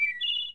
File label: win Nature Open